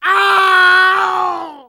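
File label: pain critical death; Spy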